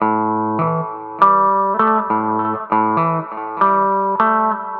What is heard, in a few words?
lazy rumba; looperman